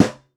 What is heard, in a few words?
FX Snare